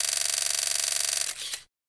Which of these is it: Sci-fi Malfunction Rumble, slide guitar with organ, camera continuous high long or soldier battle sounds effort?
camera continuous high long